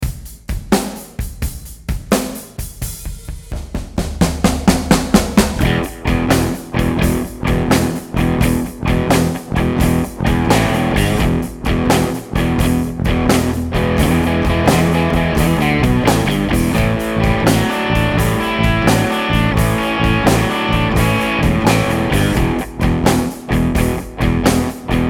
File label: blues project